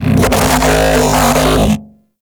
Mech Ambient Vocal

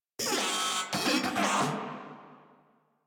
Vocal Enemy Spotted; Warframe; Moa

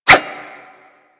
Dagger Whoosh; Warframe; Weapons; Dual Daggers

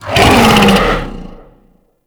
Infested Bone Blade Vocal Soft F; Warframe

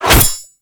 Machete Whoosh

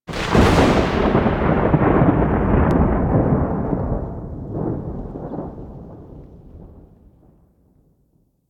Plains Thunder Clap; Warframe; Ambience; Eidolon Plains; One Offs

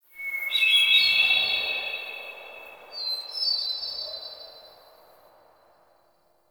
Wetland Forest Hermit Thrush

